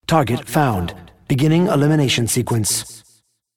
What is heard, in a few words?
Mob Voice Rampant Cyborg Regen